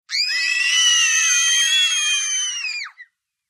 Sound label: 5 Kids Lng Sngl Scream, CROWD - CHILDREN, Five Children Interior